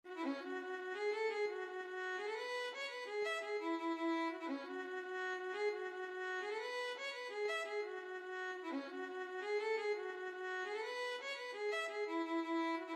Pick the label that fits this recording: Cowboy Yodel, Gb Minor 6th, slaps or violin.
violin